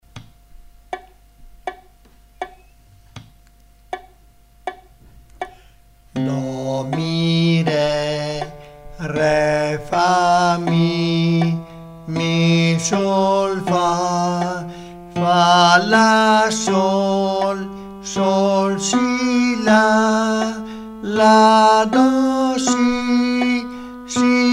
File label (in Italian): Solfeggio cantato